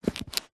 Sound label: batteries aa x2 pick up from carpet; foley; zapsplat